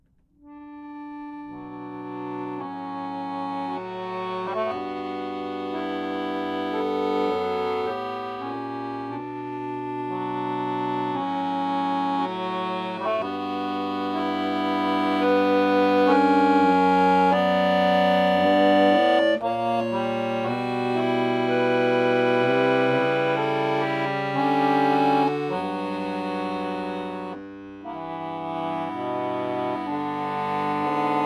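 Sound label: domra, Neumann TLM170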